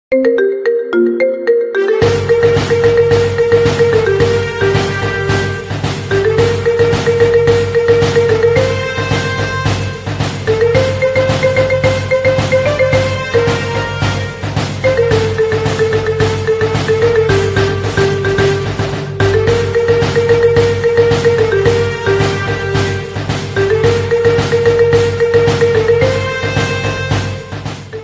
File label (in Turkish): Marimba Remix Zil Sesi; Telefon Zil Sesleri